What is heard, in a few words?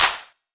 Clap; Zero-G Trance Inducer; 90 s Sample CDs